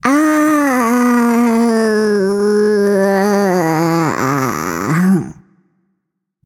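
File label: nonverbal seriously; smite; voicepack; izanami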